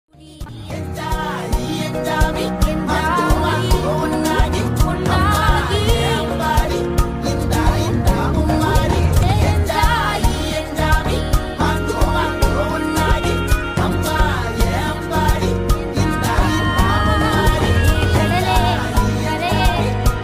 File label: bgm trending song ringtone